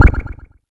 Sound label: cancel; sonic-boost